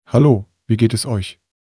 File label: piper